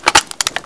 awp clip in; cstrike; DEX

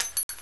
shell; cstrike; DEX

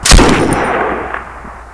awp; cstrike; DEX

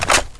m249 cover down, weapons

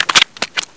clip out

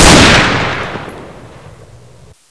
m4a1 unsil, weapons; cstrike; DEX

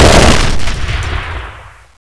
explode; cstrike; DEX

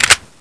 m4a1 silencer off; cstrike; DEX

